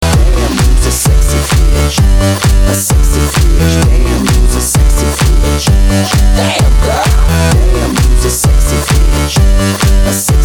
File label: win